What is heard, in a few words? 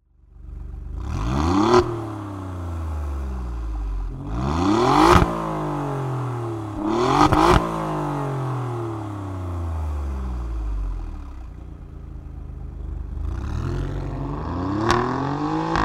Stock exhaust Alfa Romeo Giulia Quadrifoglio